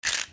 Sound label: WEAPONS